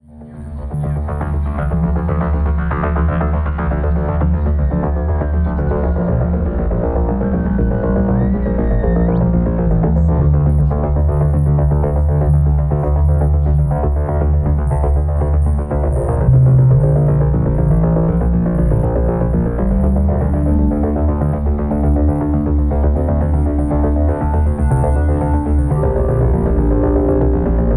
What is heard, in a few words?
incoming transition ringtone